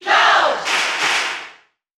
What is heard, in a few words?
Cheer German; Cloud; SSBU